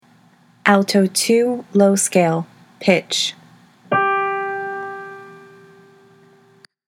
alto scales low; all-state choir

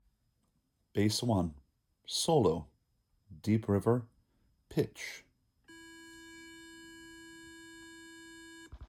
solo Bass I; Deep River; all state choir

